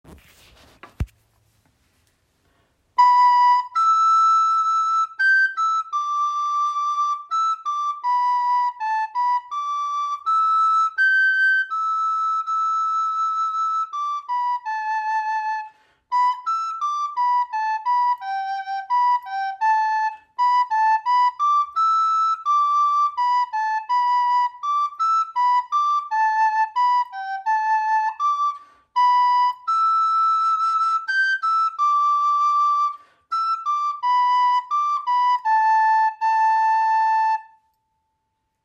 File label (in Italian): PENTATON MELODI prima voce